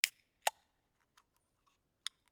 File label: aluminum can open